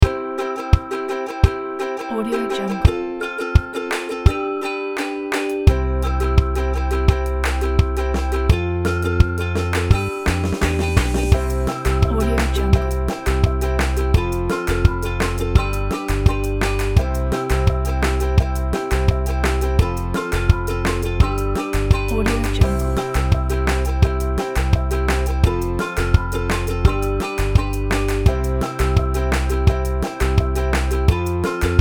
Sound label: funny tale